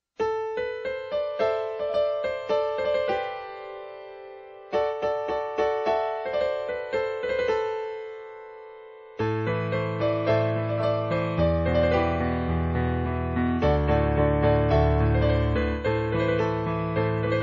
Criminal Piano

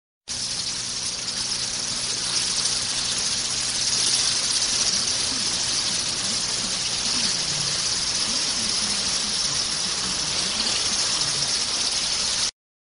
Bat Squeak

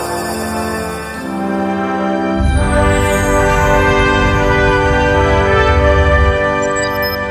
Fanfare; TNG

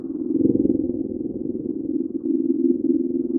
growl; Dragonine

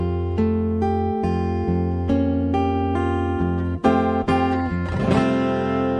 Calender Tone

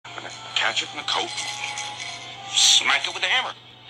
hammer smack

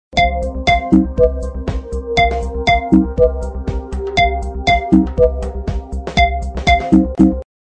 elegant

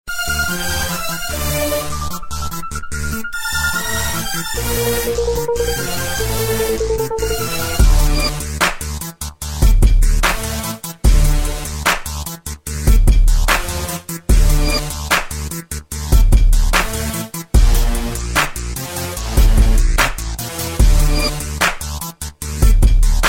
Hip Hop Beat

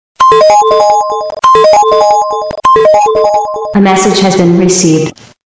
Beep Alert Message

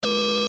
beep; Gundam

